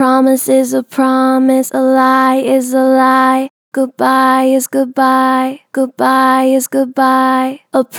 Gentle Vibrato "Promise is a promise", Vocals, Natural Vibrato Gentle; Harmor Vocals